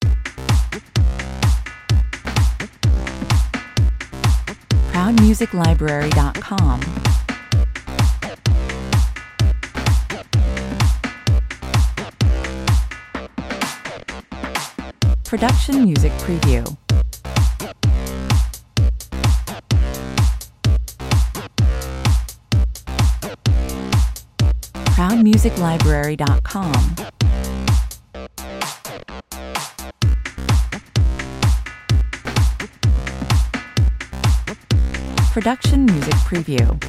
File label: bass and drums 128 bpm